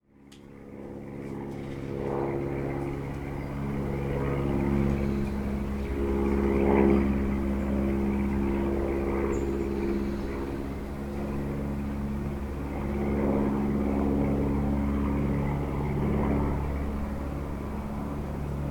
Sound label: Small Propeller Plane